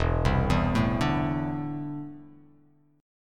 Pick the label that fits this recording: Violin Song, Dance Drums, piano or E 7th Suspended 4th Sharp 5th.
E 7th Suspended 4th Sharp 5th